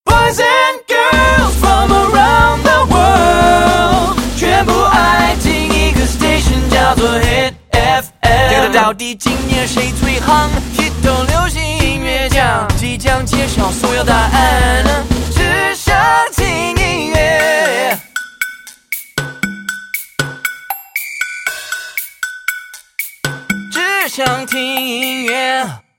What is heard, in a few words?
JINGLE